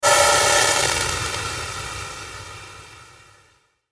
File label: Sweeper Static Reverb; Production Element Imaging Element Accent Transition; Sweeper Sound Effects